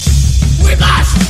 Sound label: whiplash